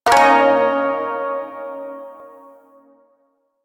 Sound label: Shawzin Yo Chord K, Music; Chords